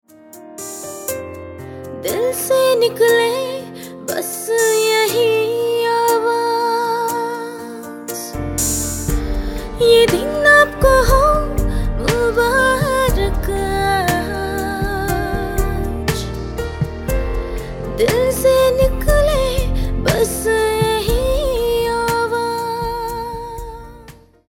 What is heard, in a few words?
HAPPY BIRTHDAY TUNE DAUGHTER TO DAD HINDI FEMALE